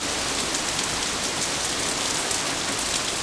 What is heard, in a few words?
rain ground; Quantum Redshift